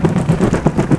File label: flap, ships engine; Quantum Redshift